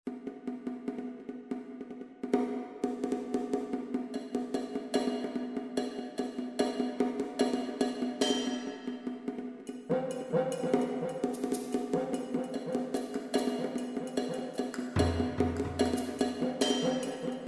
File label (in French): danse des tambours